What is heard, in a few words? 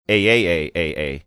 ayayayayay